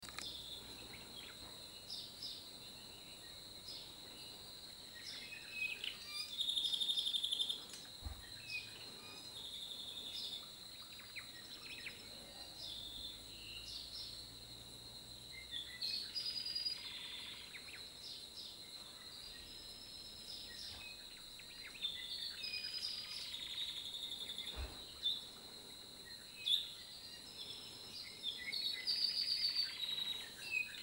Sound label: Volcanos Park birds